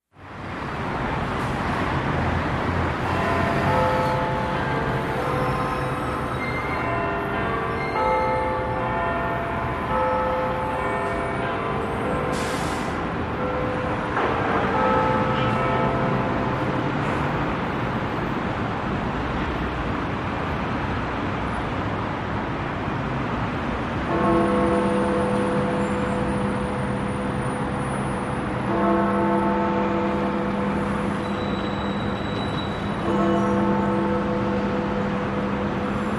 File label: Big Ben Chimes Strike, exterior; bell towers